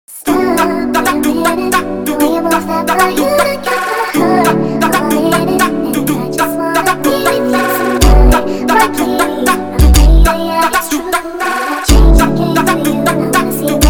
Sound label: funk